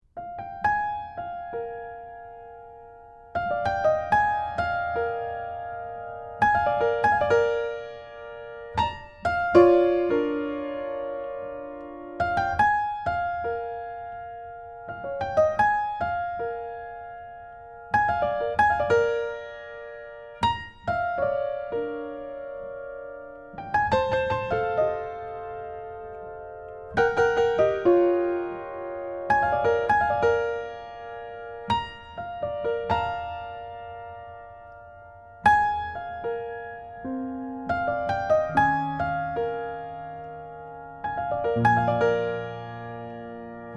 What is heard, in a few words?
blues no 1